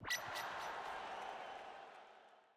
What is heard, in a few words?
status hype